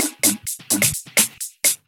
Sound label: Electro Loop 128 BPM; Vengeance Samples